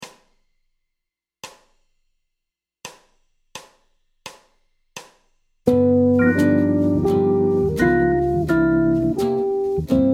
arp liaison G7 C7 F7, guitare jazz; ii V7 I∆ majeur; transformations de l arpege de liaison

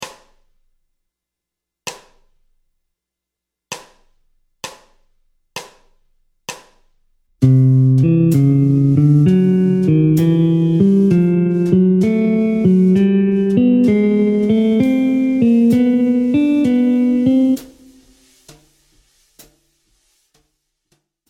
Gamme bop asc Pos 52 C Lydien, guitare jazz; boper ses gammes position 52

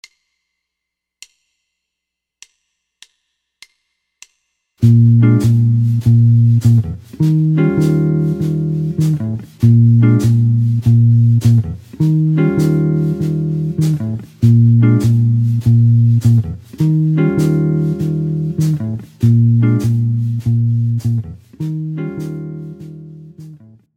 tenor fond boucles, guitare jazz

